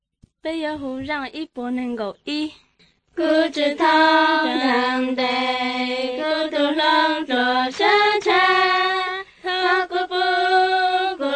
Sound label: songs hmong blue